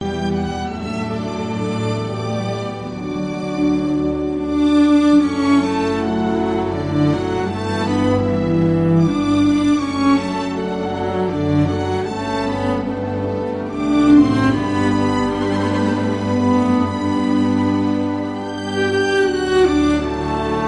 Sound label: serenades classical